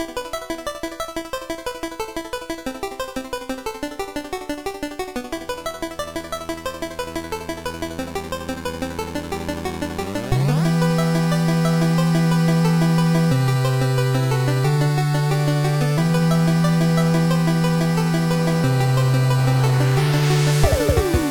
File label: Famicom/NES track